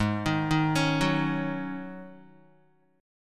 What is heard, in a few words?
G sharp 7th Suspended 4th G sharp, Guitar; G#7sus4; 3,6,3,6,6,x; arpeggiated